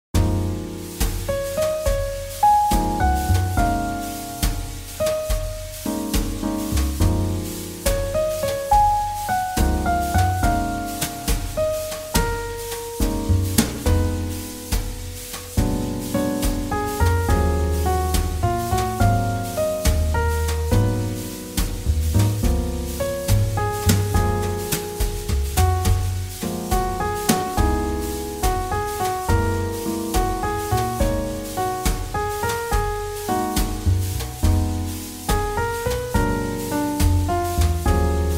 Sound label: JazzBallad; 잔잔